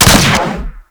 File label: Fire 2D Shot, Rifles; Snipetron